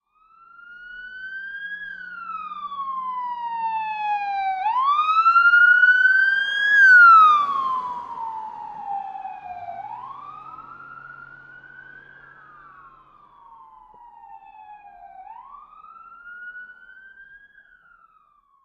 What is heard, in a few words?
Police Siren: Medium Car By, with Various Types And Without Engine Noise.